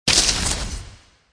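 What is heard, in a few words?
Smashing Break; hockey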